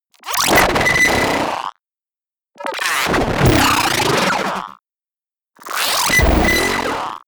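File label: glitch, electronic; comedy cartoon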